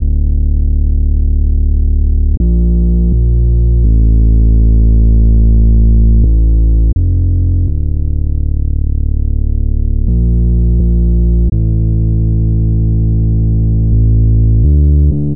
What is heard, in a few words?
Bassline 125 Dm Dry; Lifestyle